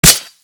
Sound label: impact; slender; dbd